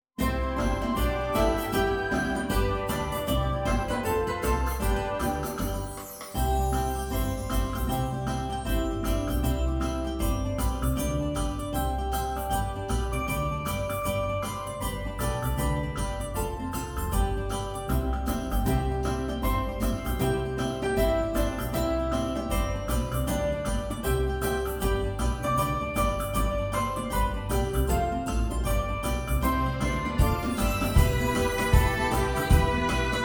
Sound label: Country Style; เกษตรสัมพันธ์